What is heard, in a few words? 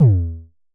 Festival Kicks - E2, Kicks, Vermona Kick Lancet; LookIMadeAThing Kicks